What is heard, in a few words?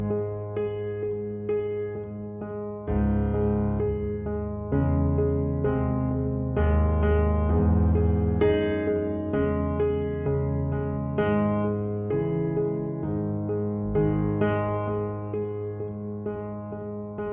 prelude in d flat major; op 28 no 15; frdric chopin